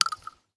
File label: shell 12ga dirt; STALKER; IWP